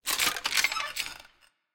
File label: Monkey Jaw; Puzzle